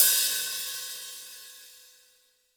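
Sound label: JAZZ KIT overhead, Drums Acoustic; AKAI; 90s sample CD; USB Soundscan vol.10; JAZZKIT 3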